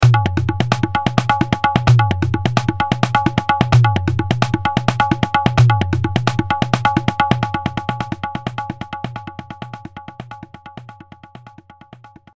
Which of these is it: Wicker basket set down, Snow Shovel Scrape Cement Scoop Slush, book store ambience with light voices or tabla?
tabla